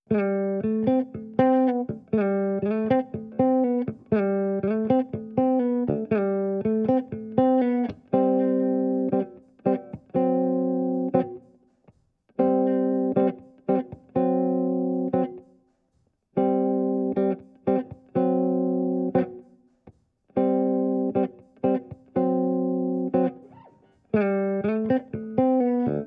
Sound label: chords